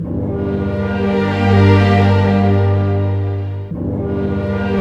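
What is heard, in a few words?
60 dark, orchestra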